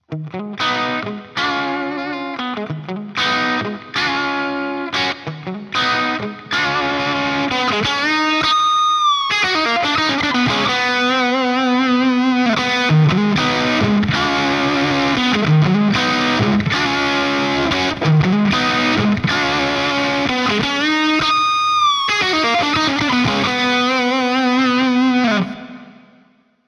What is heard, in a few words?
CRUNCH; SaturnLO